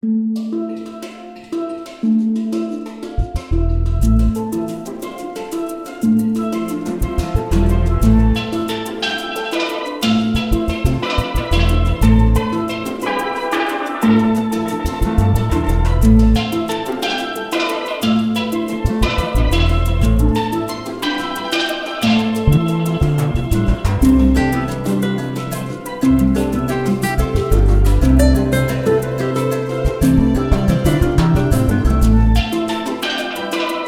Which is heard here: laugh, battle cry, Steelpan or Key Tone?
Steelpan